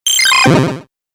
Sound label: Retro Random Bleep Accent